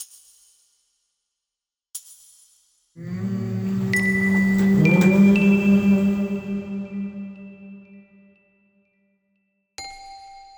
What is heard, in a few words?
Vocal Tai Chi music